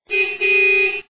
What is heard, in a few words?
car horn